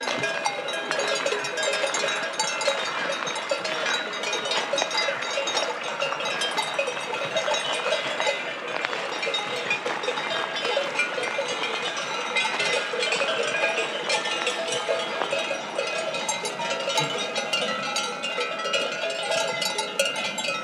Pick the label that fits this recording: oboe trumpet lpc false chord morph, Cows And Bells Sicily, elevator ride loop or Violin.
Cows And Bells Sicily